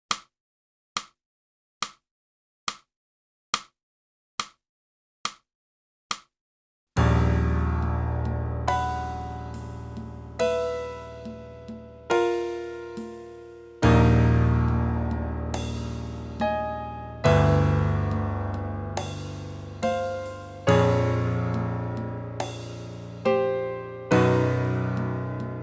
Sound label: sax